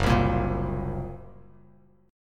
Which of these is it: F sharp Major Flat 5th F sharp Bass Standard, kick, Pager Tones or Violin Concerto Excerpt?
F sharp Major Flat 5th F sharp Bass Standard